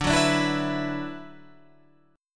D 6th Add 9th D, Guitar, Standard; D6add9 strummed; JGuitar

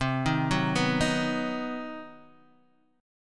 C Minor Major 7th Flat 5th chord, Guitar Open D

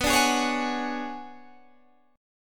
B Minor 6th Add 9th B down Guitar Open G

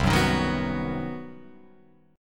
C Minor Major 7th Flat 5th chord, Guitar Open F; strummed; JGuitar

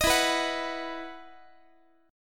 E Major 7th Suspended 2nd, Guitar; EM7sus2 strummed

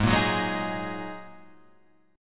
G sharp 7th Suspended 2nd, Guitar, Half Step Down; chord

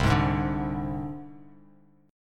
C Suspended 2nd Sharp 5th, down, Bass Standard; Csus2#5 strummed; JGuitar

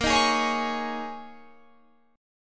D sharp 7th Suspended 4th A sharp, Guitar

